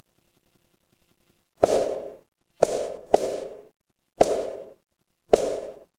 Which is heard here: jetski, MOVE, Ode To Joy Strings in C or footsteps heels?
footsteps heels